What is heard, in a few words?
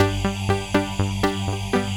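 Sequence Pattern, Keyboards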